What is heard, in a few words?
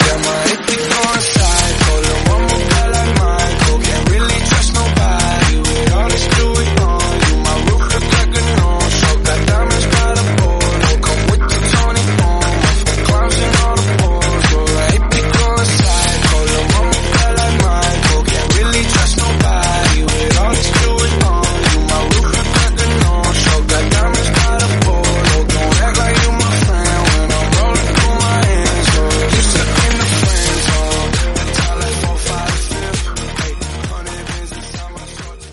133bpm Eb